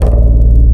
Raw Bass, wavetables; Animoog

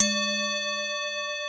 TIBETAN, TIBETAN BELLS; Crypton - DrumBase5000

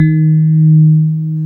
PIA DX E-PIANOS

